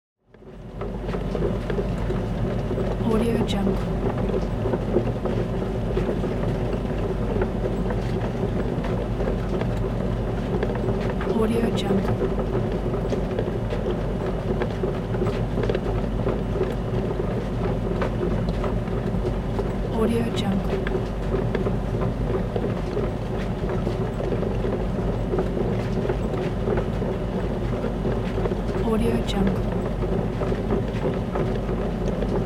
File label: old wooden mill